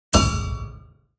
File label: Broken piano key; gfxsounds